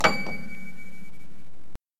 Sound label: Piano